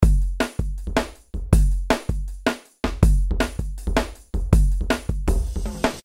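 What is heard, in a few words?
drum n bass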